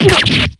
pain; gunvolt

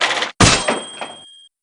whack

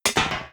kneel

